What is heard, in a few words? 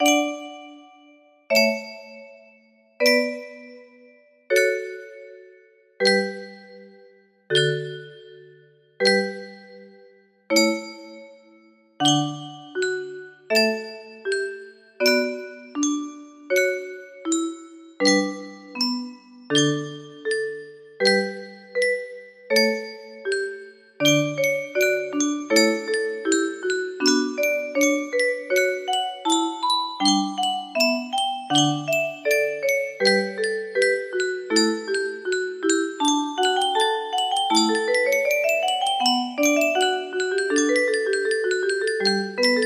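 music box melody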